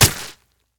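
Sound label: arrow impact; smite; ahpuch skin10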